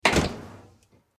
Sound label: door